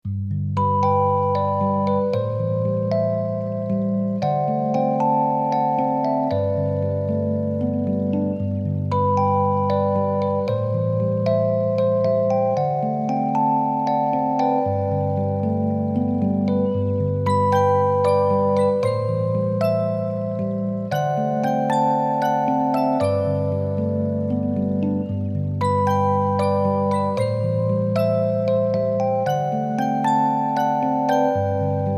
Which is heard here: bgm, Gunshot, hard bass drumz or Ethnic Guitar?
bgm